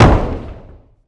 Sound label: Boxing Ring Bodyfall